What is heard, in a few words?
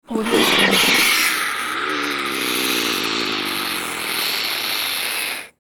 snake striking hiss; sound ideas